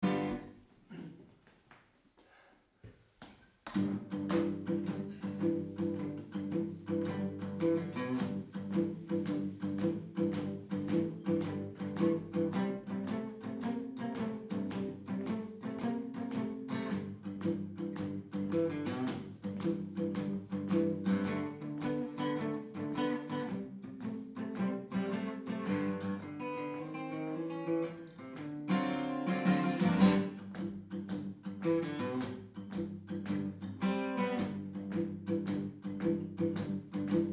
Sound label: no bend blues jam